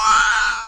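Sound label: hit ghoul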